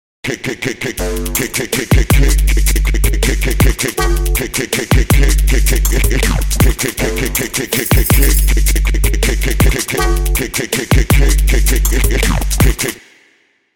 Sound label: Kit Full Loop